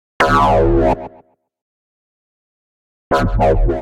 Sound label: Bass A Minor 165 bpm; MIDI Clips; Ableton; Factory Packs; Skitter and Step; Downer